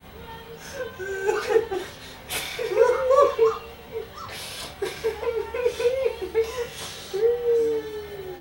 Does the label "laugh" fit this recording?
Yes